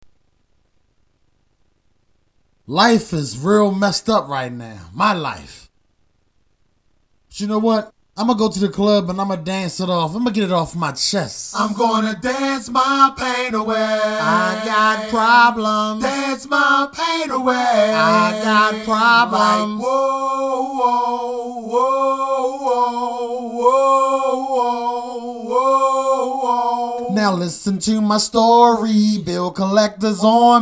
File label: acapella